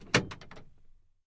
Camaro Power Lock, chevy camaro

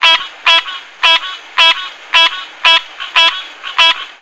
Frog Green Tree Croak

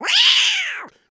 Cat Angry Squeal

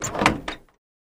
Car Old Door Open

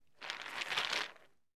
Ice Bag Movements Ice

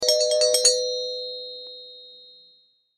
Door bell chimes, ding dong

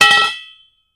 Impact Metal Pipe

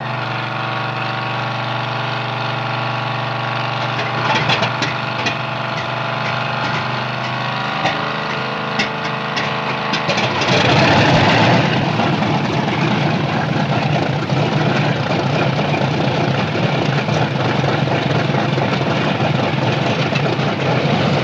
B-29 Bomber Start Start Engine, Start, Idle

